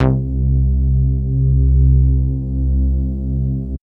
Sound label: Bass Note C